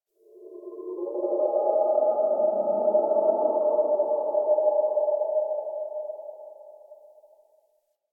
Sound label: Forest Cave Howl Mid; Warframe; Grineer Forest